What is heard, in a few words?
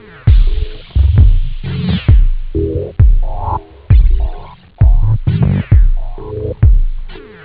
trance, house; OctaMED SoundStudio V1